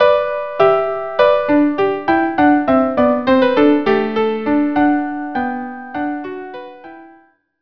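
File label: violin violin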